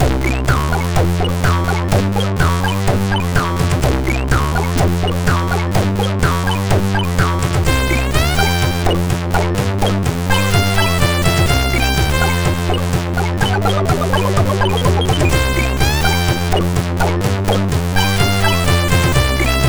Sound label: sid, MOS6581R3; Lupo Alberto